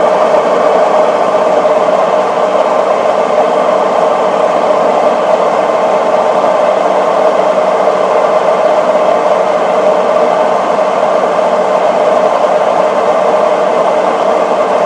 washing machine is definately not whisper quiet anymore